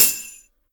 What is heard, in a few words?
drum hit whistle; osu!